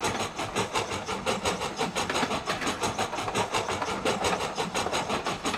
train speed loop; Oculus

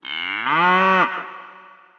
Moo Cow; Oculus

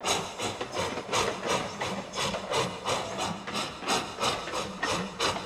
train speed loop; Oculus

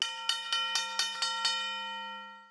Train Crossing; Oculus

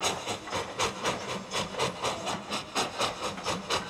train speed loop; Oculus

